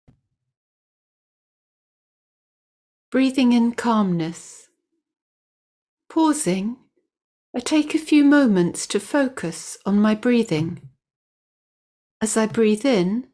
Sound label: Breathing Calmness